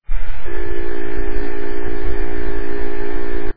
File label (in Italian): motore